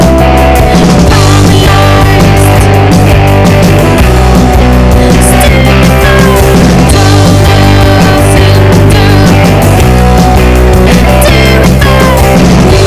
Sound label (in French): sonnerie refrain